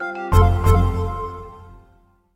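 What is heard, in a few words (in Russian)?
мелодичный звук пришедшей смс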